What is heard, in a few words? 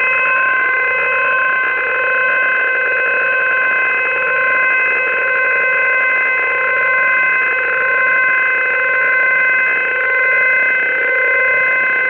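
CIS 3x PSK traffic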